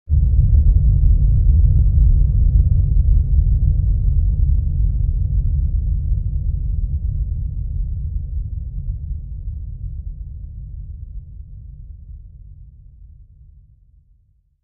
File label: Blast Rumble; blasts